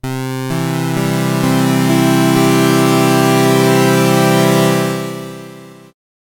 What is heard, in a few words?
midibox sid v2 multi